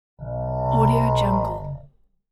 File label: creature dinosaur prehistoric cow